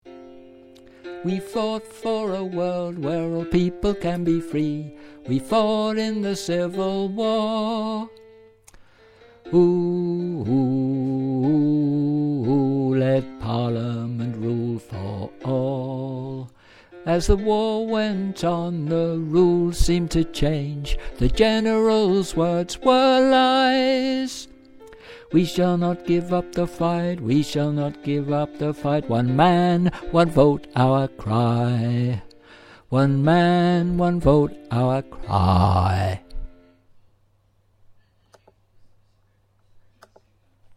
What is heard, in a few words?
Bass